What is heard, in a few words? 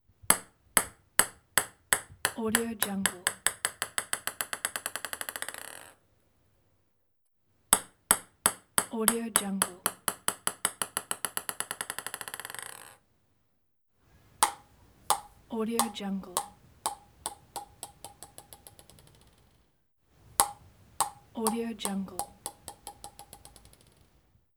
ping pong ball bouncing drop